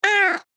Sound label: Playful kitten meow cat meowing; animal; gfxsounds